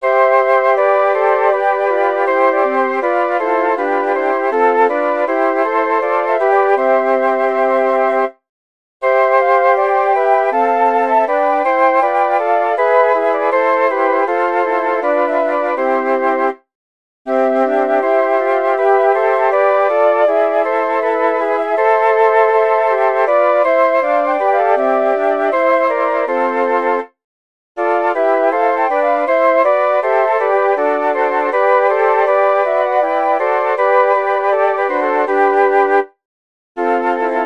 chorale for flute quartet; bach johann sebastian du su e lieb schenk uns deine gunst

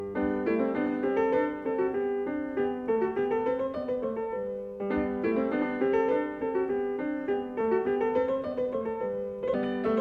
gavotte musette; Johann Sebastian Bach

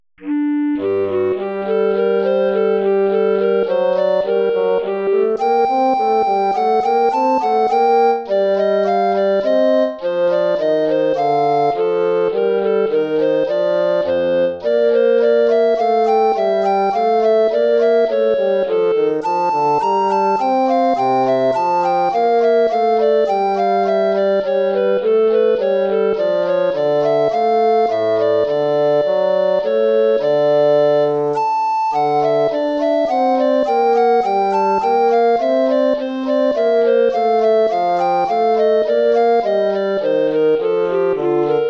courante

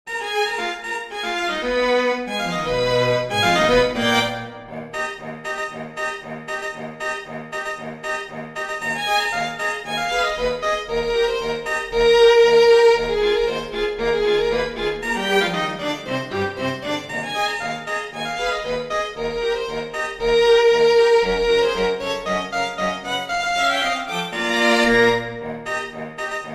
string quartet

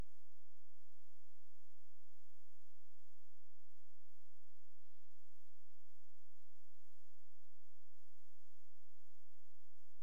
for oboe and piano

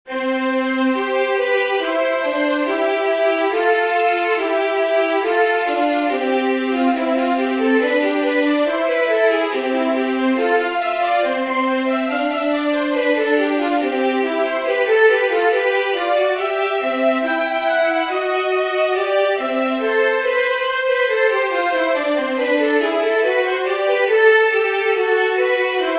retrograde canon for instruments

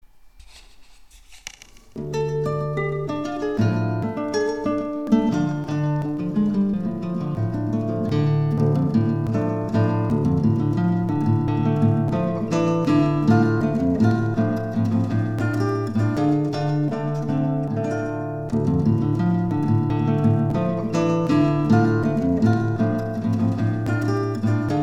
classical jazz

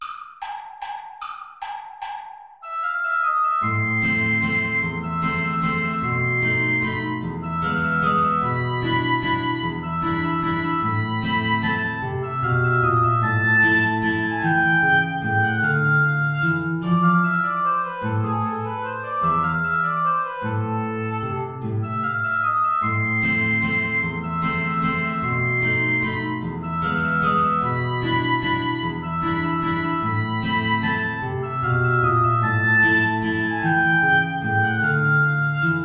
play along without clarinet; traditional

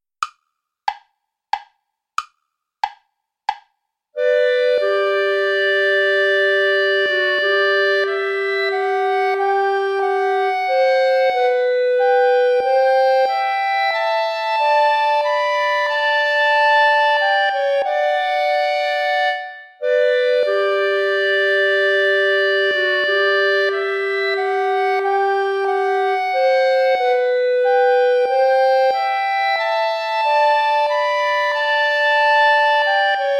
sarabande play along without clarinet

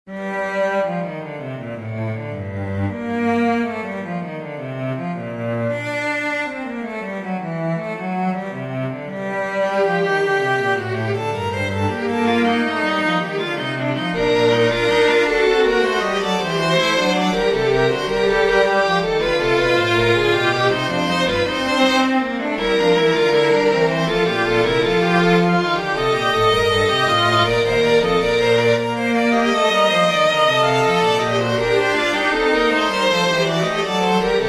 for string trio; bach johann sebastian; christe eleison from the mass minor

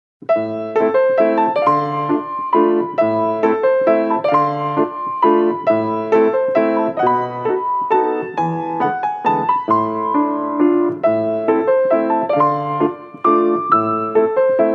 romantic walts

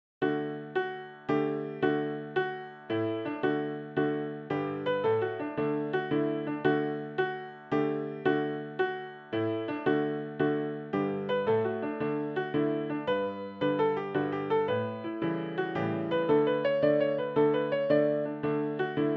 jig

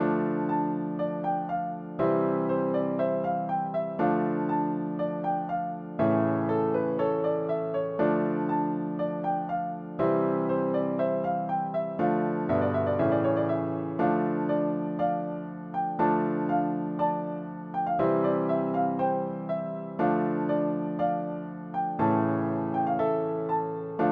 recercada for piano; ortiz diego; primera

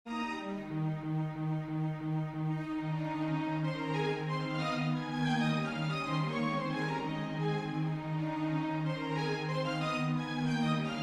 streta dell introduzione for string quartet; verdi giuseppe; from traviata

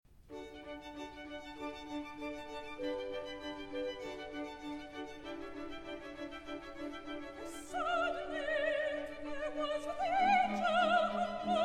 concert choir recitative for soprano; and suddenly there was with the angel

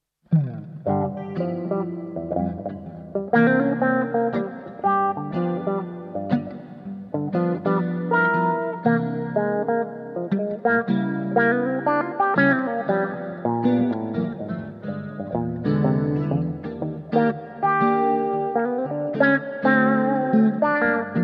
groove

